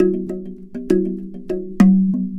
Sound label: Box Drum Twilight, Worldly Rhythms; Sonic Foundry (Sony Creative Software); Ma Ja Le Ethereal Textures